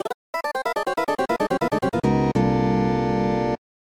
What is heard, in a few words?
Player Down